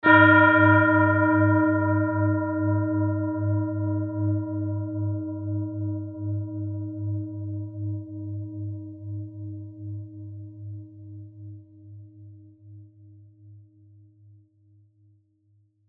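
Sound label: tibetan bowl uranusset, G sharp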